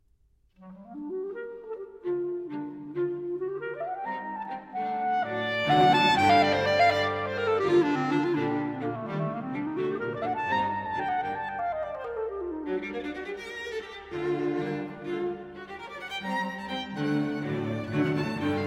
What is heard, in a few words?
Quintet